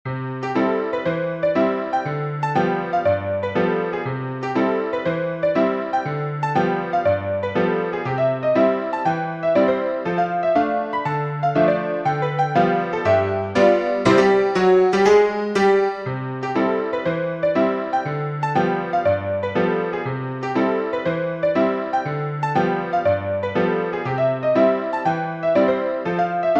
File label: piano, sheet music